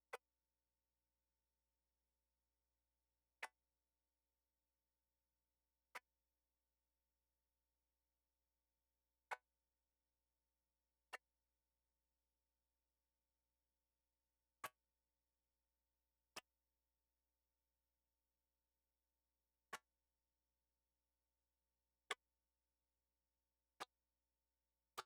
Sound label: Creek, Garden